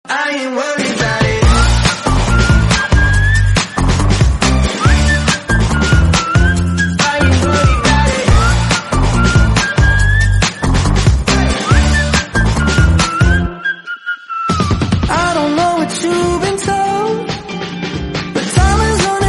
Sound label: english song